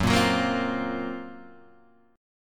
Gb Major 7th Flat 5th, down, Guitar, Half Step Down